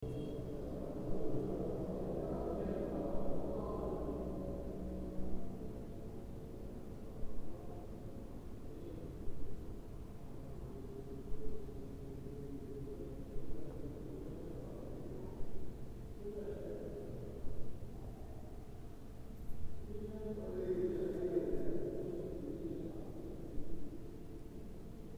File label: merkez camii duisburg; Moschee